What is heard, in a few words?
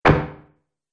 Multimedia Button; Business Programs, Apps And Computing Noises; Andrew Fleming